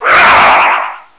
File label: race pain; svencoop